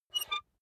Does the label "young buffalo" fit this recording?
No